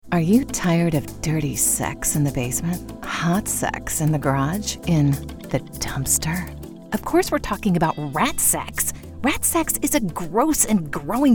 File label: ROCK